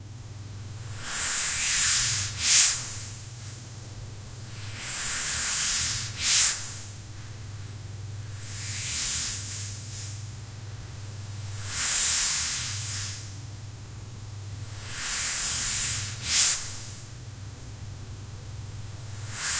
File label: very soft rope sliding